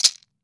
MARACAS; Cuba

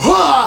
"holly hoowa", vocal

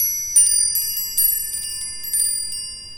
Odd Rod Loop, ambient twinkles

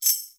Tambourine; Zero-G; Nu Disco

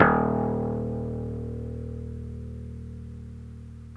BASS, D#1; Zero-G; Chemical Beats; BASSES

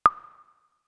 Percs, Elektron MachineDrum; SPS1 MKII

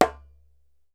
DJEMBE, African Perc; E-MU Producer Series Vol. 7 – Old World Instruments

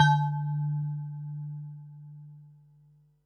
Perc, Percussion and FX; Pearl SC-40

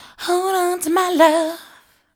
VOC, Disco Fever VOCALS; Best Service ProSamples vol.48 - Disco Fever; AKAI

